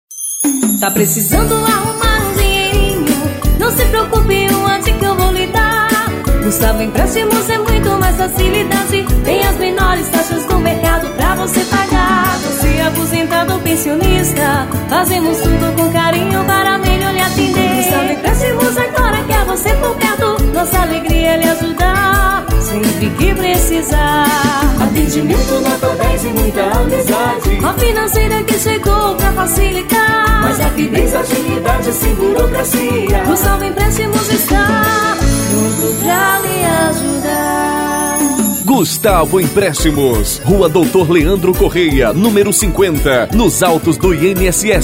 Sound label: JINGLE; GUSTAVO EMPRESTIMOS